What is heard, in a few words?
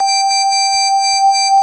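organ Vox G5, Continental, wah bar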